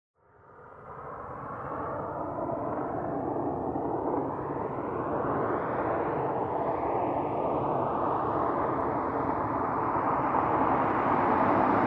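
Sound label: Corvette drives up stops and stalls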